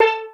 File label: STEEL DRUM; Greytsounds VOL-1; LATIN PERC